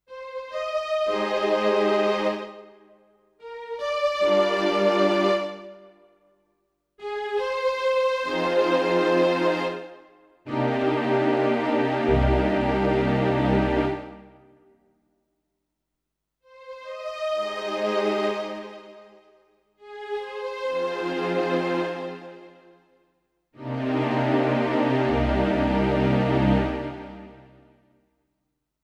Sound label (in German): Yamaha P-125 Streicher